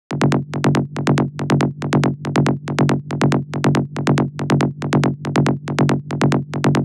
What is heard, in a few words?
Bass Loops E (140 BPM); FL Cloud